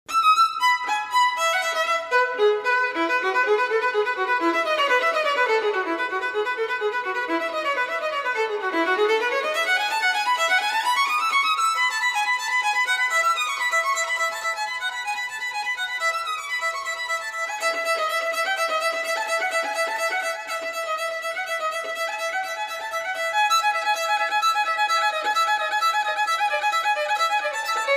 Solo violin, prelude; bach

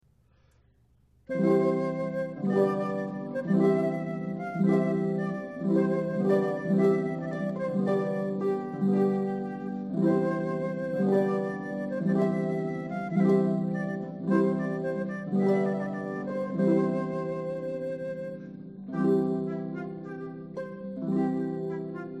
flute harp; purcell trumpet voluntary

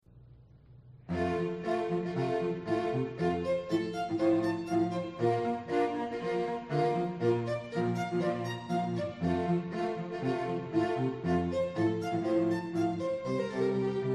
duos violin and cello; stamitz maestoso

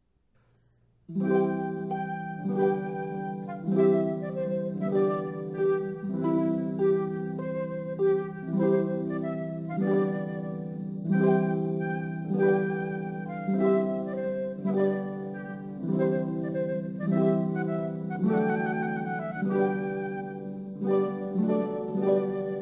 flute harp trumpet tune; purcell

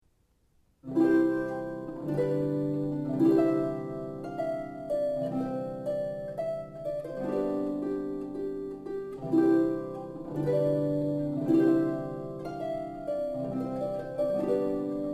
solo harp

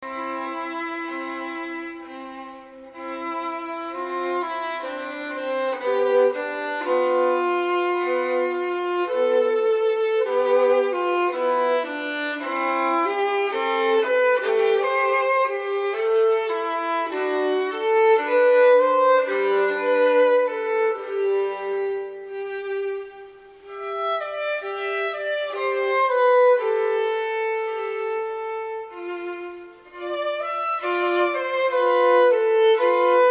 Solo violin andante; bach

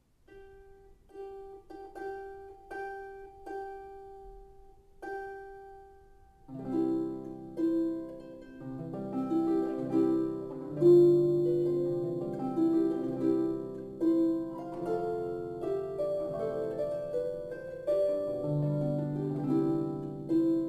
solo harp; wagner bridal chorus

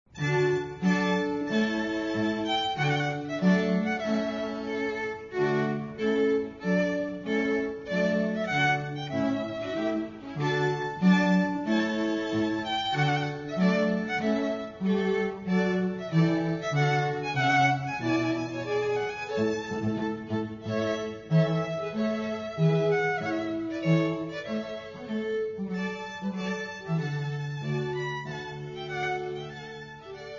Quartet trumpet tune; purcell

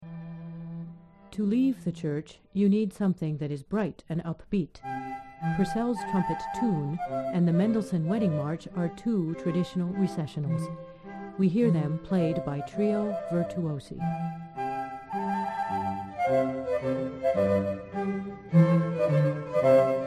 trio trumpet tune

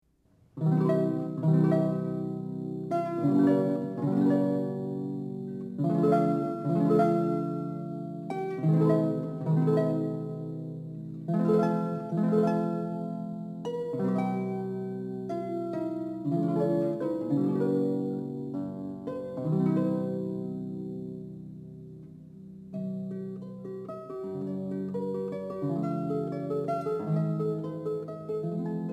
solo harp; handel chaconne

